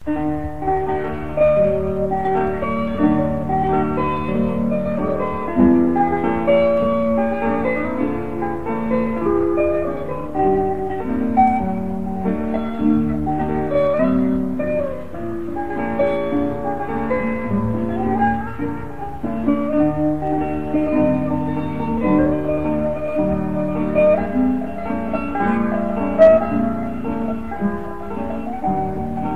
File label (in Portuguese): variações em ré maior